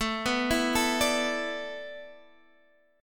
A Suspended 2nd Suspended 4th A Guitar Half Step Down, chord